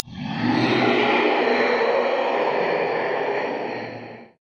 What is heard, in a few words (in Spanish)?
demonio